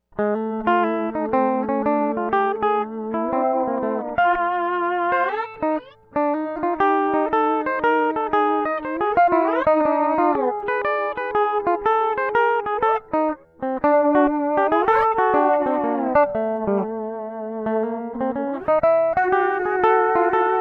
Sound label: vocoder